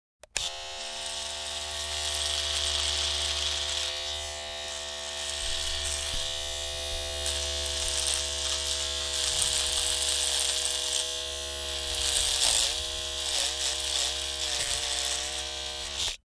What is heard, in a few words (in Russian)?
brite elektrobritvoj